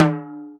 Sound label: Timbale Lo; Planet Earth; Digital Sound Factory Planet Earth